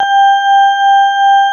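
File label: CX3 organ G5 R, Keyboards Old School; USB Soundscan vol.09 - Keyboards Old School; AKAI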